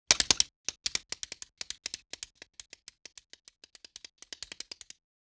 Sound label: Single Bug Insect Fs Scur; Fairlight Sound Lib